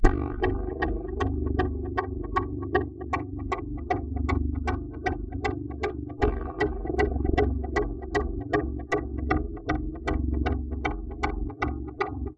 Synth Poly

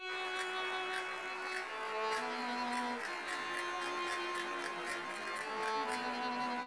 Castanets Violin Applause

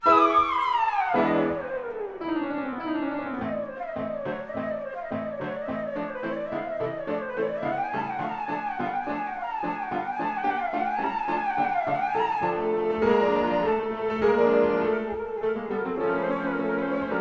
Sync Wavetable Soni

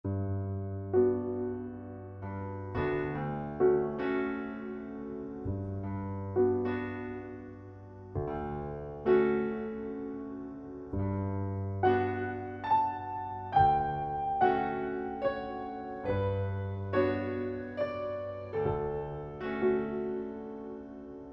Sync Wavetable Soni

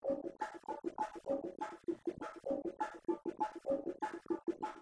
Bongo

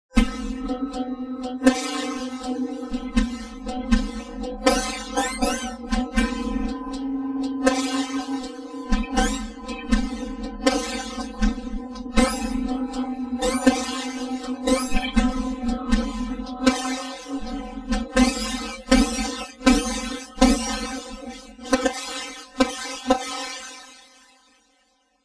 Pop

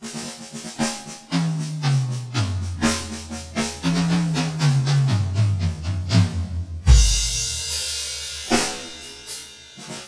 Drum Solo

